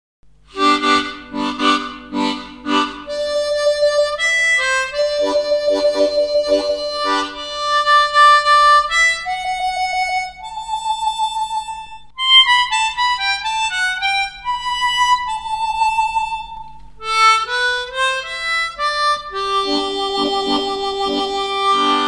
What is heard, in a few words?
blues solo, Session Steel; Mundharmonikas